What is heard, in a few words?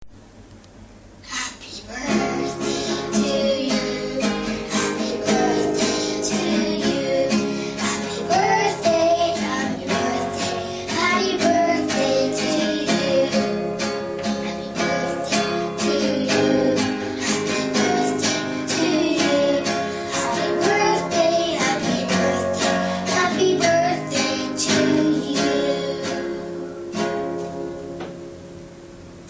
happy birthday boy